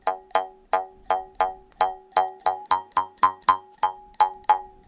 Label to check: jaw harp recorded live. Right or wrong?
Right